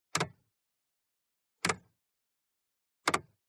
TRANSPORTATION SPORTS CAR Int Lightswitch Double Short